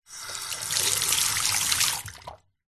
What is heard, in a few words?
household bathroom sink basin faucet empty tap on short off